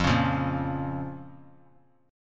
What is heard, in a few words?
F Suspended 4th Sharp 5th F; strummed; 17,20,20,20; JGuitar